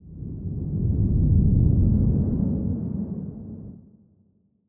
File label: kaiju adult tail swish long low; biogenesis